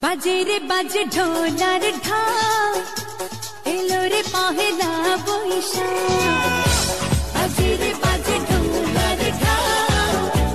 Dhol Ar Dhak Bengali Song

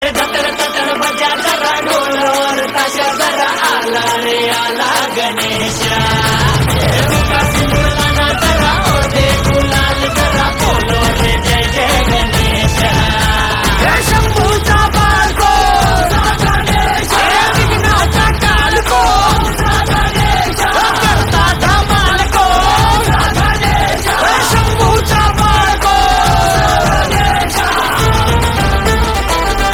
Dhol Aur Tasha

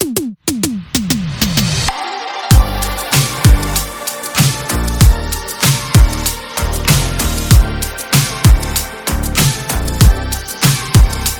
Instrumental

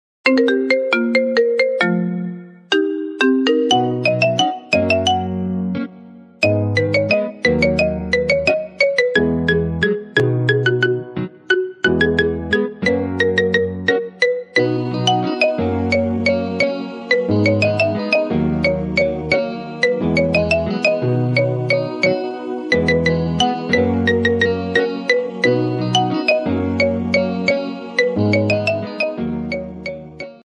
Marimba Remix

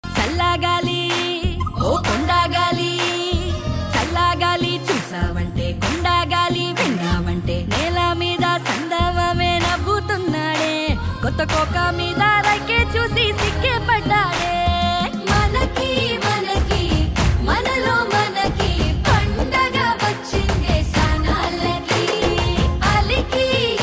Song Telugu; Thangalaan

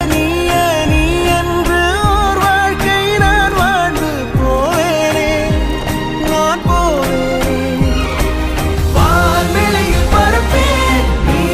Song Tamil

